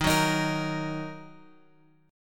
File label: D sharp Minor, chord; D#m Chord {X 6 4 X 4 6} strummed